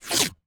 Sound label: digisweep short; CinemaSpice; Mograph Mini Transitions